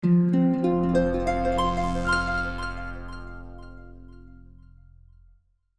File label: Pentatonic Start